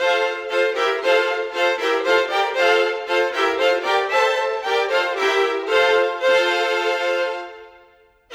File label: Violins, Orchestral; Sonic Foundry (Sony Creative Software); Orchestral VOL-2; Modern 31